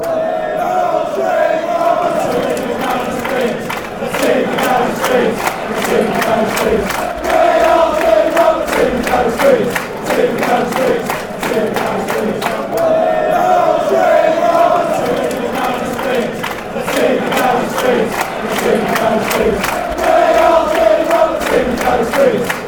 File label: cheering team, fanchants; gary speed